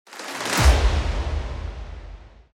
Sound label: Epic Hit; افکت صدای ضربه سینمایی